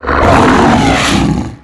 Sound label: growl; Quake 4 Alpha; strogg tik